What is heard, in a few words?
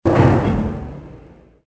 cart stop; Soldier Of Fortune II Double Helix; XBOX